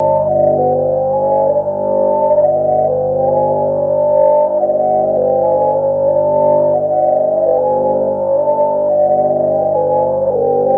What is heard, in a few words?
Probe Constant Drone With Flanger Sweep; probes